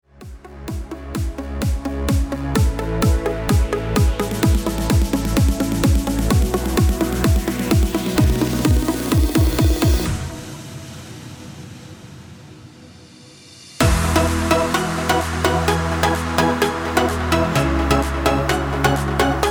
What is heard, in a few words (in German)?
ohne Chor